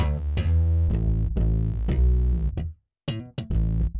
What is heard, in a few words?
bass; musdb18